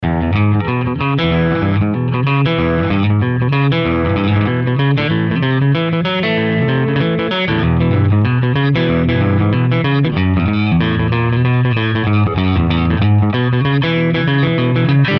Chorus, 8 bar progression, Boogie E

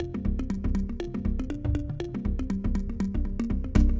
Mallimban C7 120

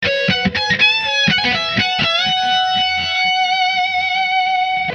Gb 122 laid back lead

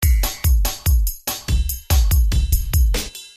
DnB Variations

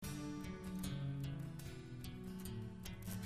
Picking D,A,Bm,D 75

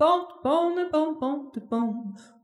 Jazzy Solo

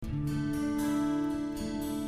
Punchy D 60

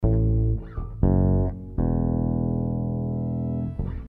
A 120 Sitarish

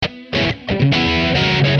Db2 134 chords

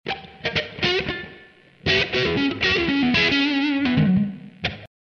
D 120 125 blues rhythm

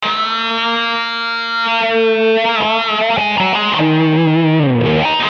080-100 solo Wah

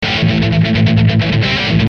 130 rhythm

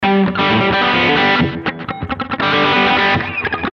130 Bridge Alt Rocker G

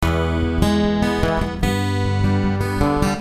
Picking E,G 75

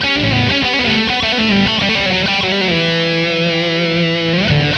lead, 100

